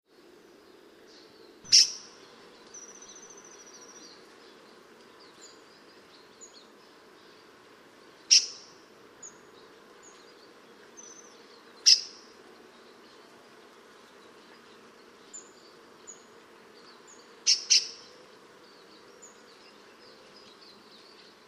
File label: new caledonia grassbird, les grandes fongeres farino